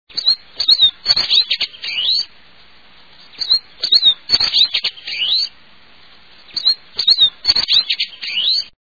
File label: Lawrences Goldfinch